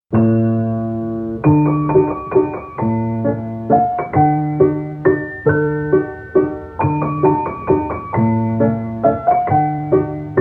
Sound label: Piano Waltz; The Moomins